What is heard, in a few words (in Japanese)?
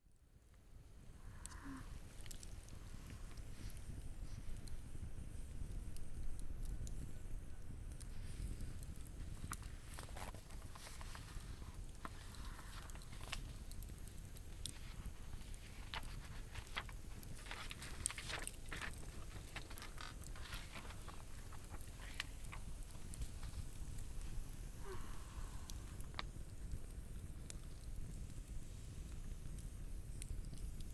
居眠りする書き物作業＆焚き火の音 セリフなし